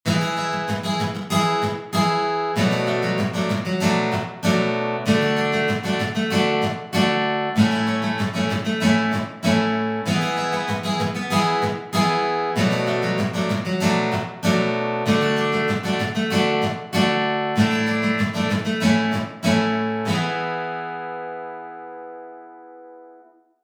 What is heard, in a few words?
Guitar Strumming